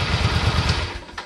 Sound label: suzuki c40 shutoff, motorcycles; stereo mic